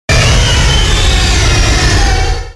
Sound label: primal kyogre cry